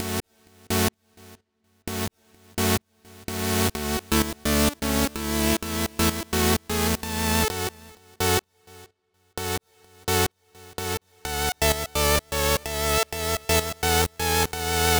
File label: synth chords, Key A Bpm 128